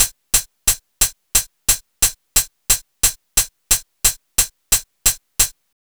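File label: MPC hi hat, drum kit; USB Soundscan vol.03 - Pure Electro; AKAI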